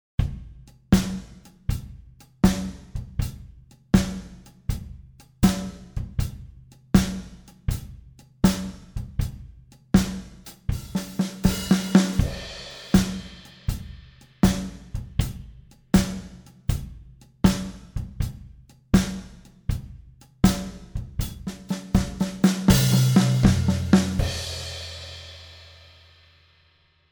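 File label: blues drumming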